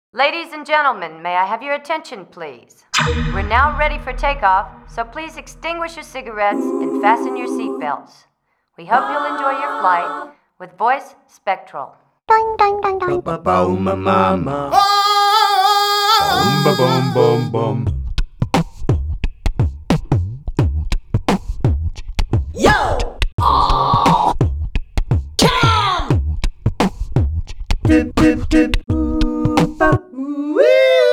Music - Voice Spectral; Time + Space - What's Next!

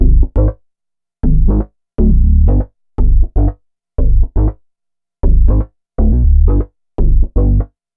Bass Line; Eclectic Electronica

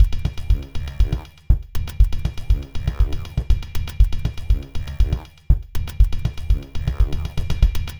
Minimalism, Processed Percussion

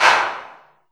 REVRB TR 9+8 LARGE ROOM 909

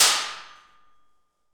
PRC ALMWA, Aluminum Wall; Rhythm Section; FX Perc

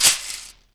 DM5 Perc, Alesis DM5 drum machine

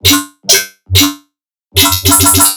BASS SHOT

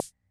Keynote MR-101 Auto Rhythm Snare; Keynote MR-101 Auto Rhythm Sample Pack; 300 Drum Machines

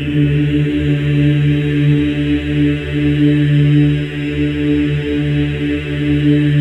D3, Symphony of Voices men; MEN EES OCT

